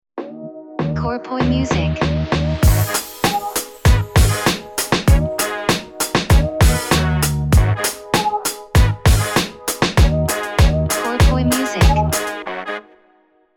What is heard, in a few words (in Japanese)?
jingle ウォーター